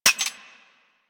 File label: Pistol Mech; Sentient; Crp Sent Amalg Pistol